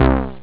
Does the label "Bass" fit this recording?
Yes